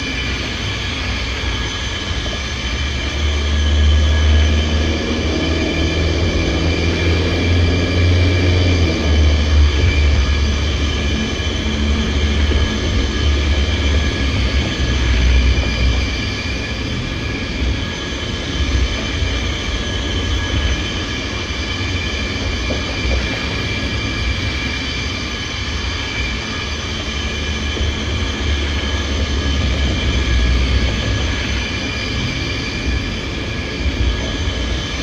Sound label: Ambience; knight rider